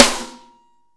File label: SN AMBIENT, Real Mega Drums; Best Service; Real Mega Drums VOL-1